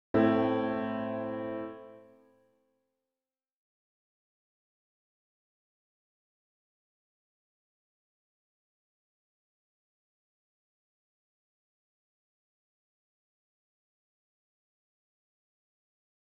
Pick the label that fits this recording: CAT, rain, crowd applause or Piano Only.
Piano Only